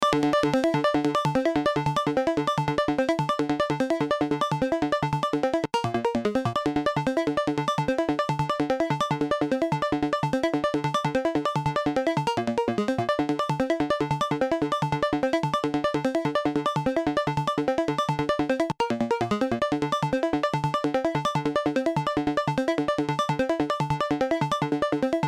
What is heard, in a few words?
Tierra Audio Gravity VCA seq atk fast rel Auto